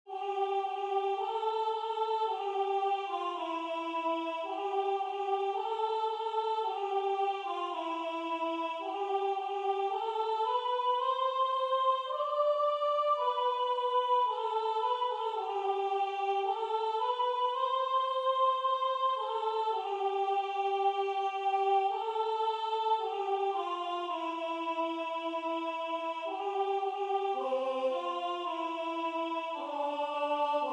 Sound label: Soprano